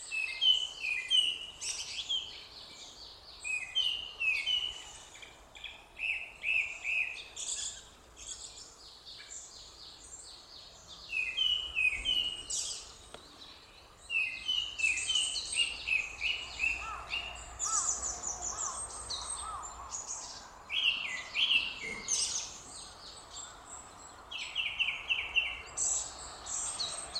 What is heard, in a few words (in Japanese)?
クロツグミ